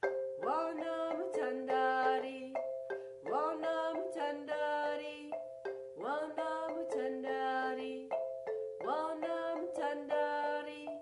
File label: voice